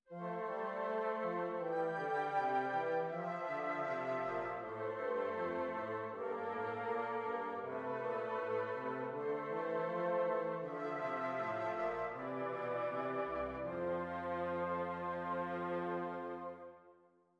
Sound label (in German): Stimmiger Contrapunkt; Ronge